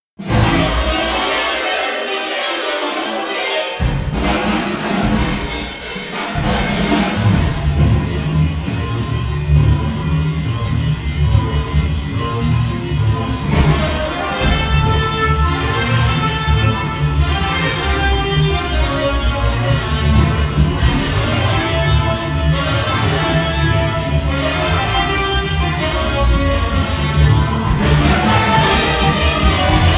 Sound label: overlap music